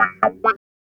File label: Clavinet; Ambient Grooves